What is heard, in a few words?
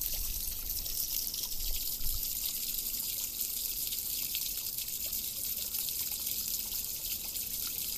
drain